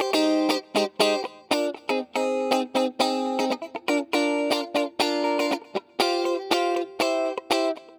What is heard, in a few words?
Guitar, 120bpm; Zero-G; Nu Disco; Nicengroovey C